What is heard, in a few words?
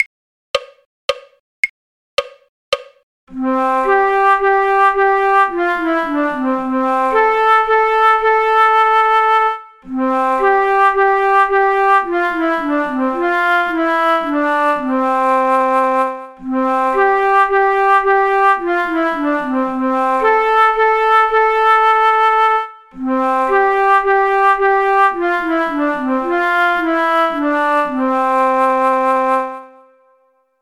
flute; lavenders blue; folk